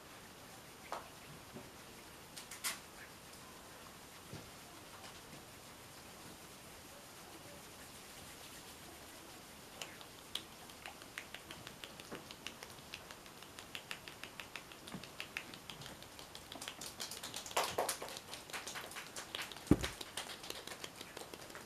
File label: Thunderstorm Soundscape